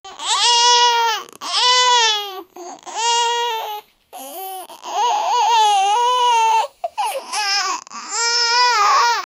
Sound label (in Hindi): mujhe ek bacche ki rone